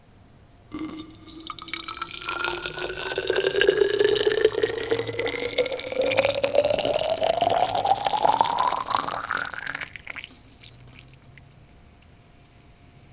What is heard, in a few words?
pour boiling water